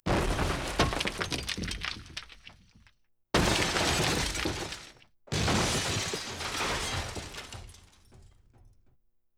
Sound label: Explosion Debris Sweetener; Weapons & Explosions; Sony Pictures Sound Effects Series Volumes 1-10; Sony Creative Software